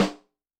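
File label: Dry guy rim, Yamaha DTXpress-II snare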